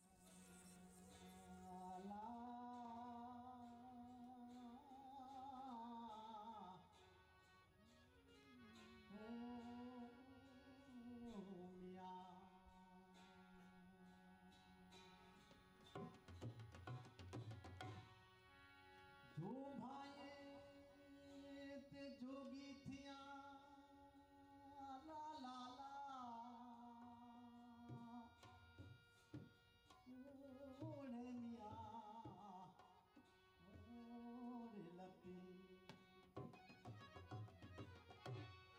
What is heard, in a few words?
Performances Ambient